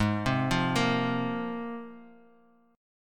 Ab 6th Add 9th Ab; arpeggiated; JGuitar

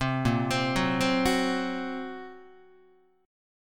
Bb Suspended 2nd Sharp 5th Bb Guitar; arpeggiated; 4A,4E,5A,5D,5G#,6C#; chord shape; 3,6,3,4,4,5; JGuitar

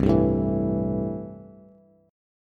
C 6th C down Bass; C6 strummed

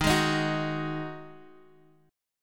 Eb Minor Double Flat 5th Eb Guitar Half Step Down; Ebmbb5

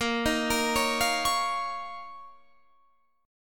Eb 7th Suspended 2nd Bb

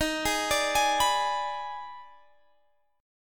D sharp Major 7th Suspended 4th Sharp 5th; D#M7sus4#5; arpeggiated; x,18,18,19,21,19; chord shape

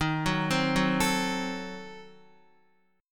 Eb Diminished 7th, Guitar; Ebdim7 arpeggiated; x,6,6,5,6,5; JGuitar

